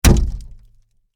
Impact Door Wood; Miscellaneous Door Sound Effects; Miscellaneous Doors (Sound Effects:Doors)

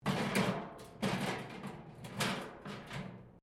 metal rubbish bin move concrete; Metal (Sound Effects:Materials)

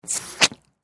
Water, Garden Hose, Faucet Squirt, Suction Chuff; Yard Tools; Big Room Sound

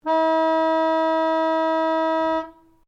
ORGAN PUMP CELLO E3, Old portable church organ; Keyboards; Sound Effects:Musical; Foundation3

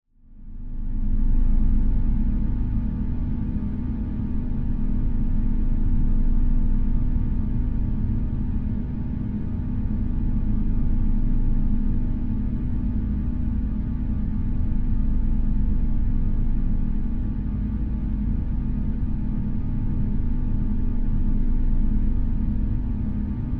Horror Drone Ambience Dissonance; Scary Tones; Blastwave

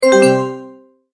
multimedia tone, Goodbye; Tones (Sound Effects:Sound Design); McKinney Sound

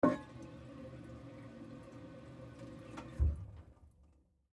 Toilet Lid Off, Toilet Lid Remove Stop; Bathroom; Household; Blastwave

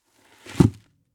Foley Sand Pail Pour Into Bucket; SFX Bible 6

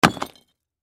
Impact Rock Smash Break Hit Cinder Block Debris; Blastwave

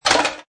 Metal Can drop; Metallic; Metal sound 09 Can Drop 3

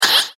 Zippy Bleep Sound; Beep Sound Effects; electronic sound effect

